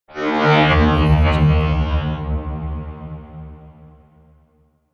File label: dark space swell; ikosonik